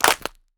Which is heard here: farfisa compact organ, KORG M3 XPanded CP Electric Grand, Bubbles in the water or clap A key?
clap A key